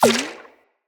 Ricochet Mushroom Hit; Puzzles; BeanStalk

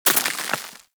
Bullet Impact Debris Snow; Warframe; Weapons; Bullet Impacts

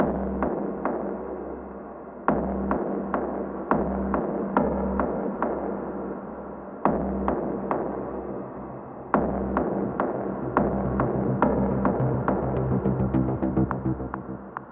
Hubs Hangar Amb Cue A; Tenno Hangar

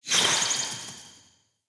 Bow Projectile Flock; Weapons; Player; Bows; Fey Thorn Bow

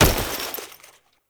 Foley Weapon Fire; Warframe; Characters; Kahl

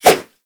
Nunchaku Whoosh; Weapons; Warframe; Tenno

